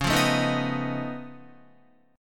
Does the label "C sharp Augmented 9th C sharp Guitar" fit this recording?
Yes